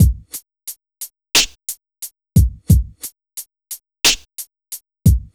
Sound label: The Beat EQs; Conquering EQ